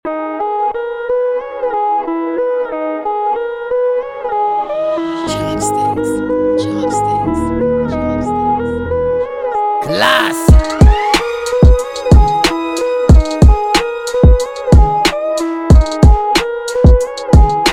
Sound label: Instrumental Chop Tha Beat